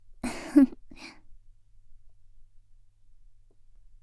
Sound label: laugh, ASMR; Garden; WithRin-Nazo01